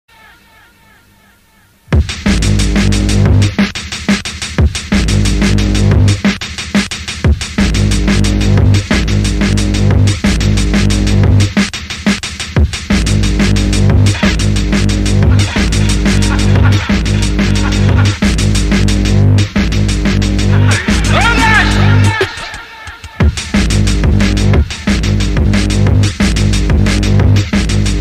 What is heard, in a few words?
dnb mix; sniper